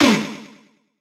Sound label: Froster pain